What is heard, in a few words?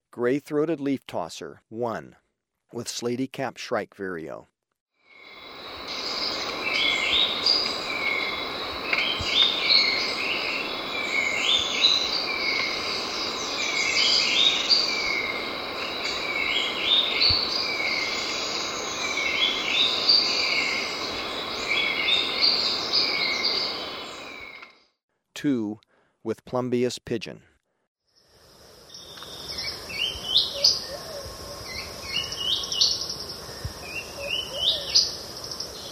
Gray-throated Leaftosser; S. America